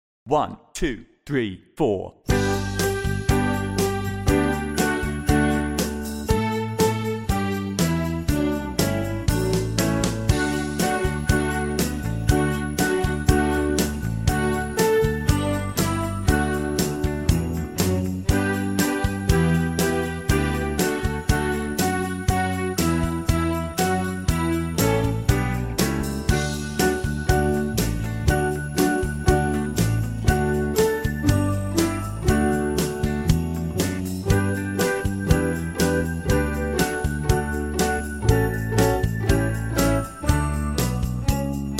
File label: Jingle Bells Fast